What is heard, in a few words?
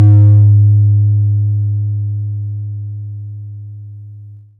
snare, Korg Electribe ER-1